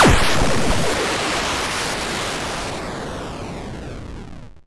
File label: long, explosions